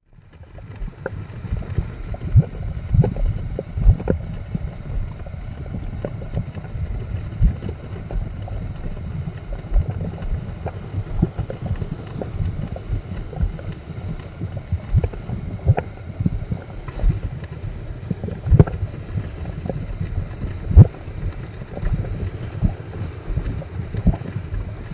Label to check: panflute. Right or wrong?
Wrong